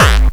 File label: bombo, kicks, Hardcore